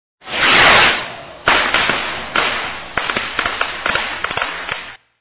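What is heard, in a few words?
firecrackers; petardy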